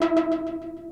synth; osu

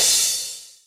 Cymbal G

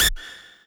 lead a

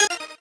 normal hit normal

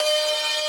synth main

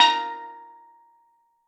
Steel Drum Bb5

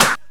soft hit clap

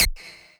lead

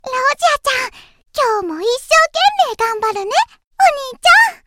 applause; osu

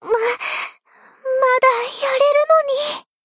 fail sound

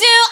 vocal

